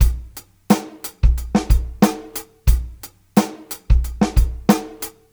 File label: Live Beats, R&B Drums